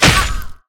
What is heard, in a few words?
devilfairy hit; Akeron